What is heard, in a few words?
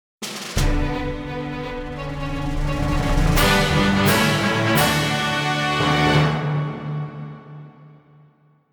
Jingle (Shouri Fanfare -Normal-); Fate Tiger Colosseum ORIGINAL SOUND TRACK [Complete Edition]